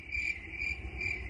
Grilo Notification

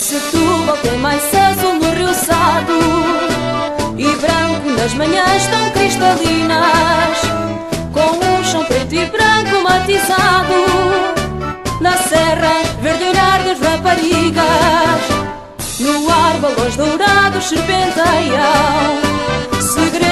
Marcha; Setubal 2011

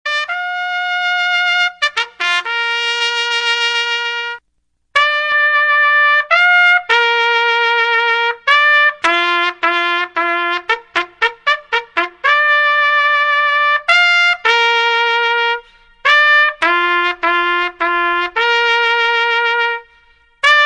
ALVORADA MILITAR

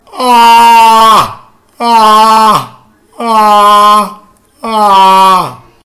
Risada

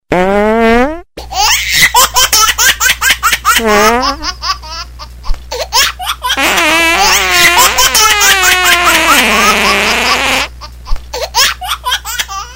Bebe E Peido

